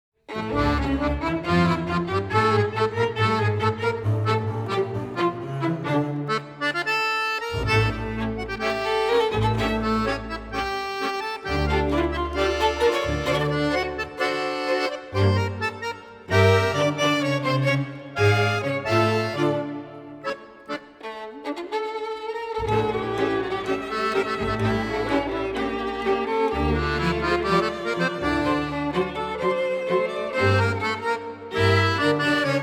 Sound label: Moroccan Tango